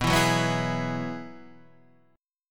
B 6th Suspended 4th B, guitar chord; B6sus4; strummed

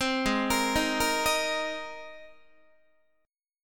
Ab Major Add 9th Ab Guitar; Abadd9 arpeggiated; JGuitar

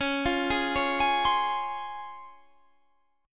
C sharp Major 7th C sharp, Guitar Standard; C#M7 arpeggiated; 21,20,18,18,21,20; JGuitar

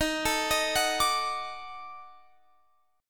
D sharp Minor Major 7th Flat 5th, Guitar Open F; arpeggiated

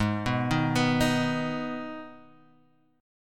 G sharp Minor, Guitar, Half Step Down

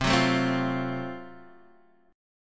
F sharp Minor Major 7th Flat 5th C

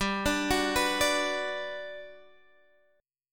B Minor G; Bm/G; arpeggiated

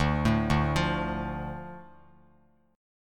D Minor D Bass Standard; Dm arpeggiated; JGuitar

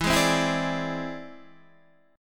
E Augmented 7th E down Guitar Standard; E+7 strummed; JGuitar

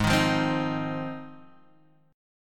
Ab 6th Ab, Guitar, Standard; strummed; JGuitar

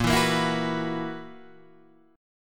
B Major 7th Suspended 4th A sharp

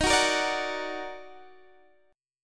D sharp Minor Major 7th, Guitar Open D

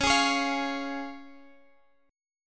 C sharp Major, Guitar Half Step Down

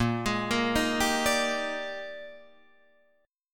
Bb 13th Bb down Guitar Drop D; Bb13 arpeggiated

